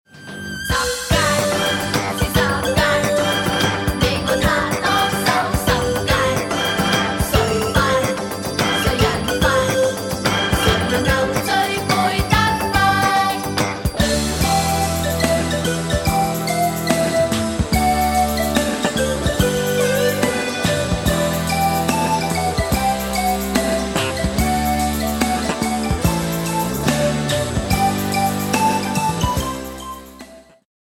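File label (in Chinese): Karaoke, 兒童詩歌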